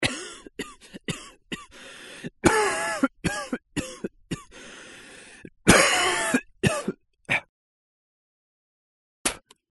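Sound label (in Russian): zvuki kashlja, coughing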